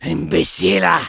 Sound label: Medic negative vocalization, fr; "Imbéciles…"; Robot Medic